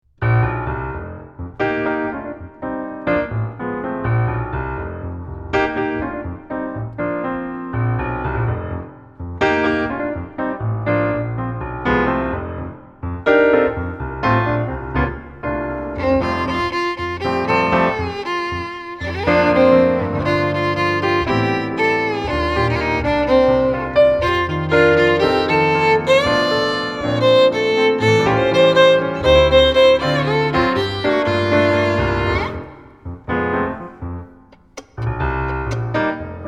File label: Violin; gospel funk/trad. Negro spiritual; Mary Had A Baby